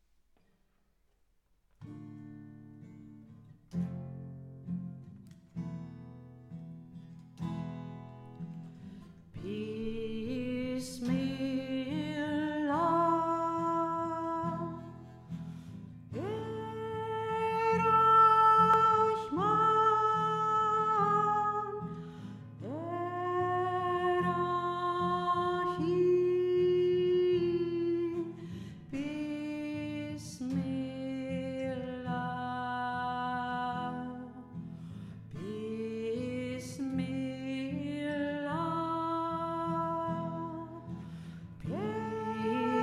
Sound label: Bismilah solo